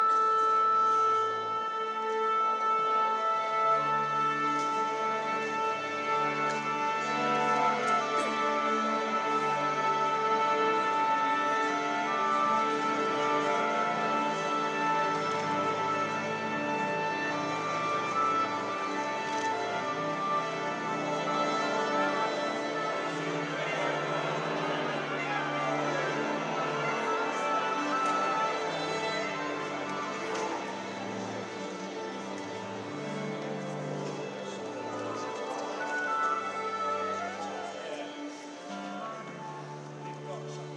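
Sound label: tuning the BBC Concert Orchestra; watford colosseum; bbc sound of cinema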